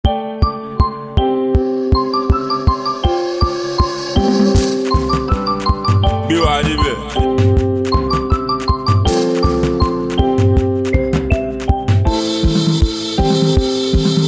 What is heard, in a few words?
Mara Beat